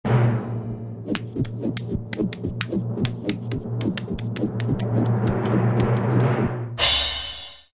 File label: heal juggle